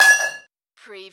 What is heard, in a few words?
Button Sound - Metal Cup Dong